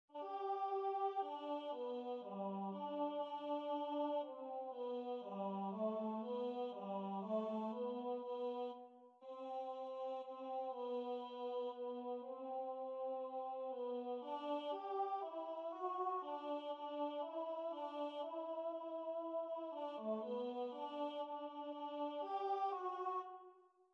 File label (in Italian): coro tenori; 1 parte I; Idomeneo